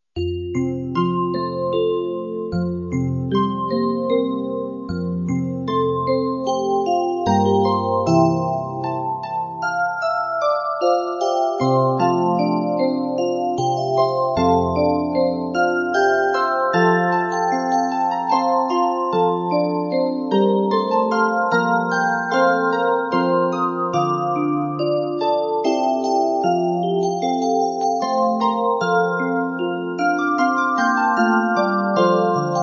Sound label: Obo